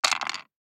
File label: DICE on Wooden Tray, Throw and Roll, Standard, 5 Five Dice; Samples; ArticulatedSounds; DICE Sfx Party (Extended)